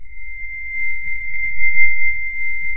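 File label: Japanese FLUTE C6; Soundscan vol.60; Asian Traditions; AKAI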